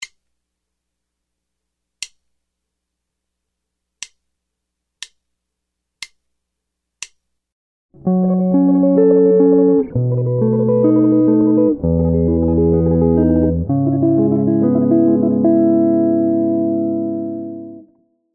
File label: jazz guitar solo arrangement tremolo